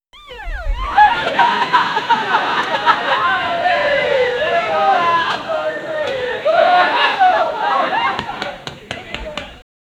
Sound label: women at bachelorette party